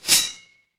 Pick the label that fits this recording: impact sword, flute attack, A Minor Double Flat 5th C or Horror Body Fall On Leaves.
impact sword